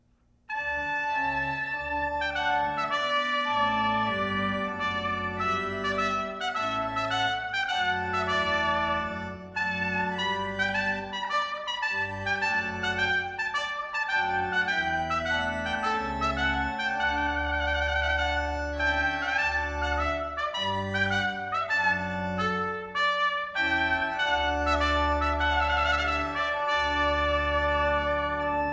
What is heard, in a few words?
Organ; Stanley